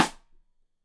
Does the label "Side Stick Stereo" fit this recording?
Yes